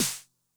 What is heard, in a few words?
Low Tune High Tone, 909 snare drum; classic machines; 909mod